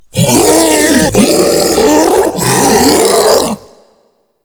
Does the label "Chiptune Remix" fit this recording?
No